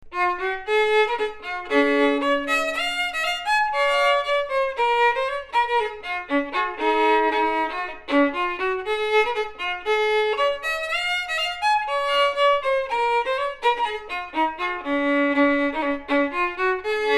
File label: solo fiddle